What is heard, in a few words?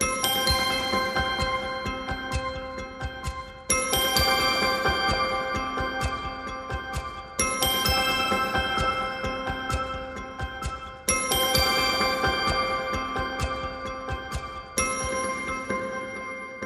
Peace on Earth Chimes Pulse